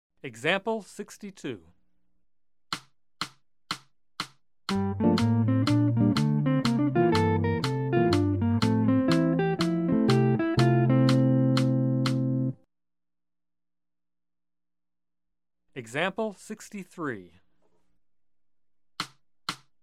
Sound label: Jazz